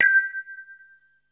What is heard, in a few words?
PERC G5; SEQ AND PERC; Music Store - Claudius Diemeter Schwerlos